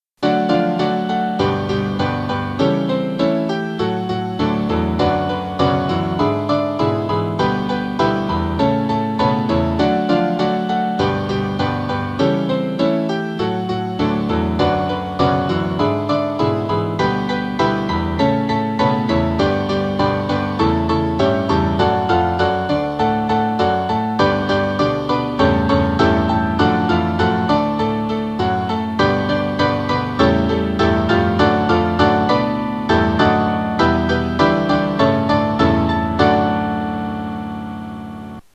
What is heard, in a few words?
Piano